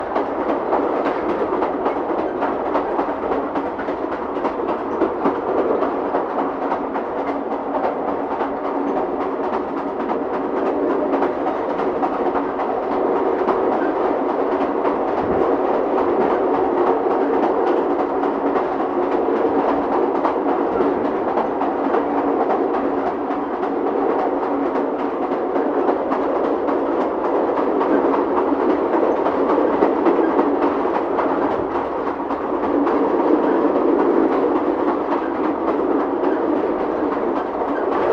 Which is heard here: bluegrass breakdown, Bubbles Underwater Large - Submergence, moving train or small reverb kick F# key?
moving train